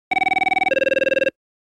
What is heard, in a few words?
ring